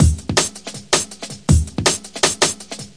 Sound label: jam, drum loops and breaks, 161 bpm; 161 to 180 bpm